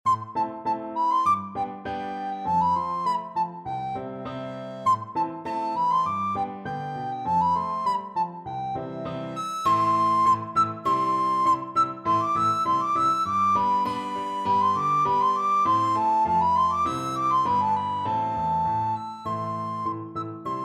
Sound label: parsons farewell, recorder; playford